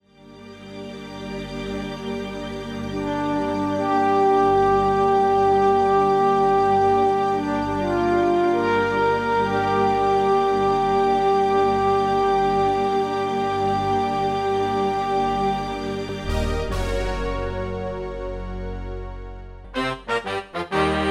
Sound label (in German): K2661 Custom ohne Samples